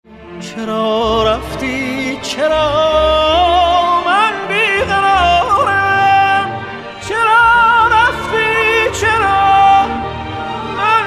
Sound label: Ringtone Mobile